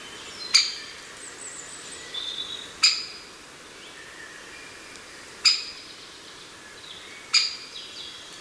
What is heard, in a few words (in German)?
Buntspecht